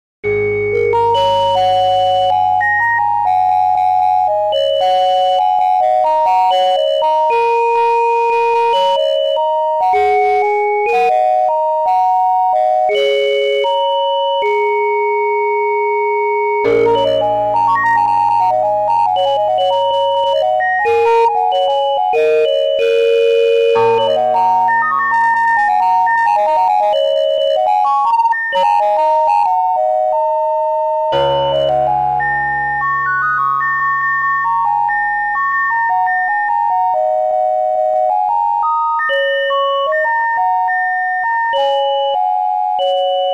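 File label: piano bassoon with ocarina glockenspiel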